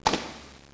coat drop; disaster

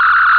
Phaser; trek109

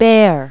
bear

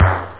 DZING, SNARE; da capo vol1; drums

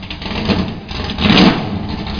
vroom

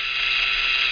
slide; Meteor

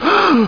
GASP

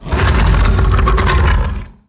hoplite die; DAoC

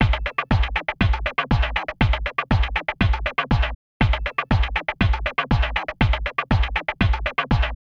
drum; Evolution

